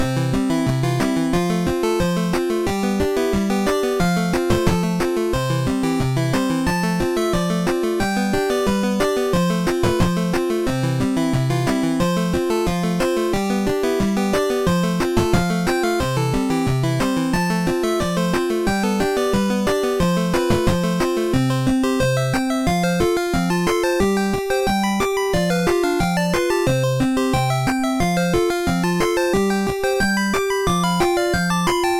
Helium Plains, A short, simple, happy chiptune made in famitracker